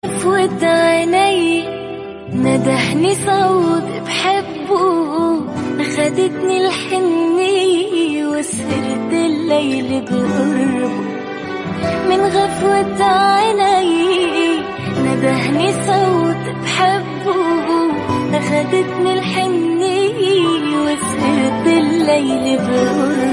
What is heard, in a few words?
arabic ringtone